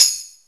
YAMAHA R5+RX7, DRUMCOMPUTER; Retro Sampler

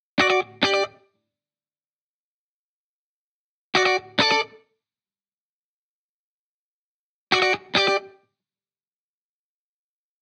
Guitar Bpm135 Key D, 70s Guitars; Fox Samples - Back to the 70s Guitars

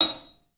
HAT, CLUBHAT, 3D Drums; 3D Sound

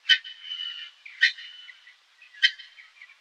Birds Various, Birds; outdoor

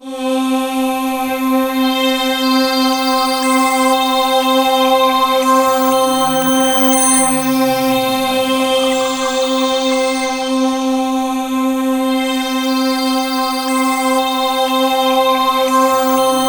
SynthLine C4; 90 s Sample CDs; NiceBeats; SynthLine VOL-15

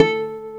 W BASS A3, Bass Guitar; STACK BASS; AKAI S6000 CD-ROM - Volume 1

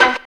hit cello; AMG - Now CD-ROM (Roland); B.2.B. Fun

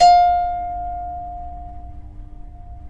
CEL HARP F4; USB Soundscan vol.23; Historical Instruments; AKAI

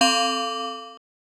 Bell; Genesis

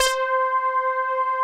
ANA KEYS; Pro-Rec - Synthsonic; JV ANALOG1

